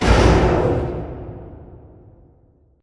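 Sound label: Prop Rack Start Distant; Prop Warehouse; Titanfall 2; Boomtown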